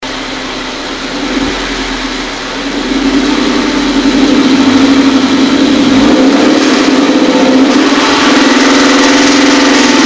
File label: knock